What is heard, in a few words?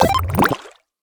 super fuel up; netent